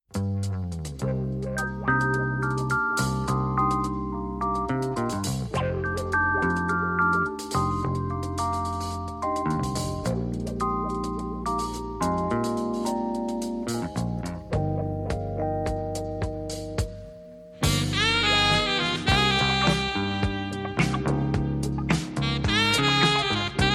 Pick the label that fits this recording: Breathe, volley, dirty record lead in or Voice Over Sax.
Voice Over Sax